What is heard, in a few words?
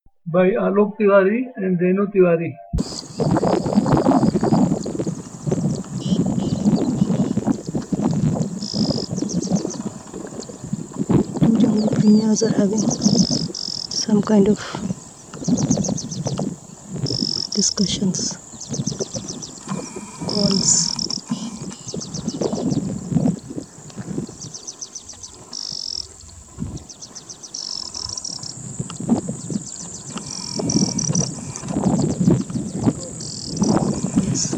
Delicate Prinia; Alok